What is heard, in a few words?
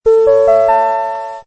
electronic gong sound